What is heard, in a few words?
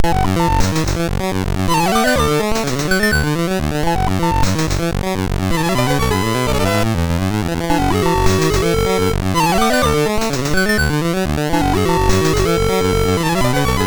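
Penetrator, MOS 6581 SID chip music, games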